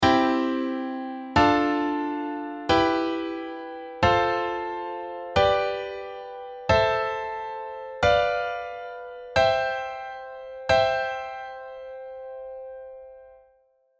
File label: major triad